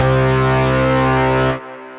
Nerve Lead, Synth Lead

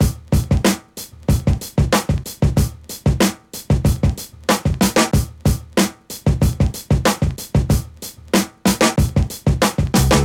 Drums, breakbeats; Captain Rock; Ableton; Breakbeats by KutMasta Kurt

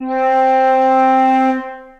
Concert Flute Section Legato; Ableton; Orchestral Woodwinds

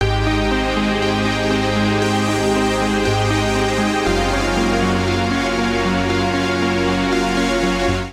Pad with attack (Ab) 118bpm; Music Loops; Gracious Fields; Ableton; Singularities

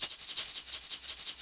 D&B Shakerloop; Dance DJ; Percussion; Shakers

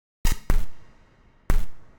IDM Perc, IDM Percussion

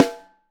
Top, Snare

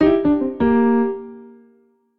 Phrase Blues C Dominant7 109bpm, Grand Piano; MIDI Clips; Ableton

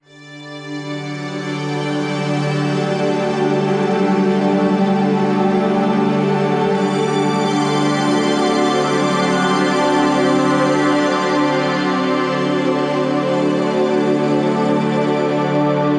Grand Strings - DMaj 90 bpm; MIDI Clips; Tonal; Atmospheric; Ableton; Factory Packs; Mood Reel

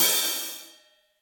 Hihat Open Room; Drum Hits; Room With A Vista; Ableton; Factory Packs; Session Drums Studio; MPE Hits

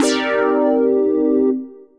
Nerve Chord, synth lead; Ableton; Glitch and Wash

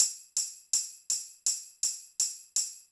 082 Pop Airy Tamb; Pop Rock; Percussion

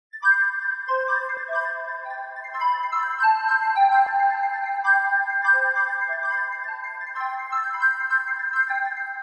C Major 104 bpm; MIDI Clips; Tonal; Doves; Ableton; Factory Packs; Lost and Found

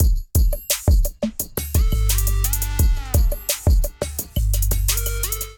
Psycho Kit groove 86 bpm; MIDI Clips; Ableton; Factory Packs; Beat Tools

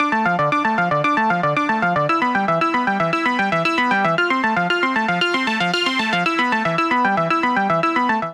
SynKeys melody (Dm) 115bpm; Music Loops; Logan; Ableton; Singularities

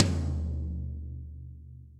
Tom Floor Studio Basic; Drums; Drum Hits; Ableton; Session Drums Studio; MPE

